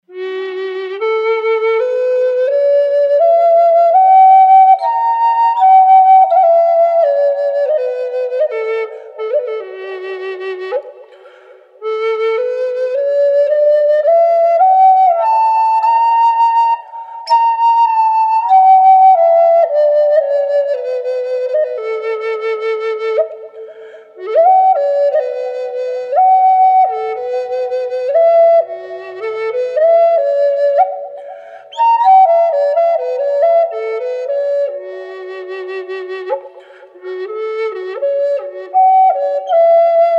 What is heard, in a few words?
f sharp fat bubinga wet, flutes